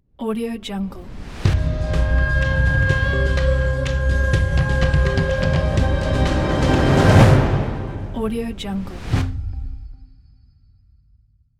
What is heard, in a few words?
mystical logo sport